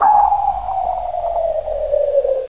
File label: SONAR